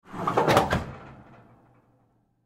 Oven Door Open; Household; Appliance; Appliances; Blastwave

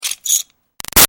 Metal Squeak Rock Scrape Small Piercing; Materials

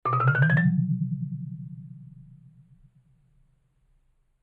Xylophone Ascend; Low; Mallet Sound Effects; Musical; Cartoon

